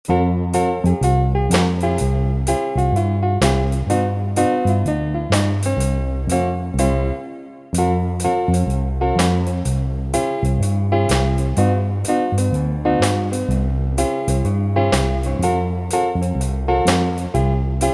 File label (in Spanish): Pista instrumental sin melodia